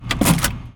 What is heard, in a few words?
Punch